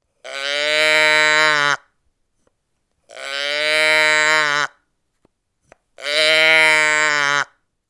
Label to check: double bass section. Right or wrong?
Wrong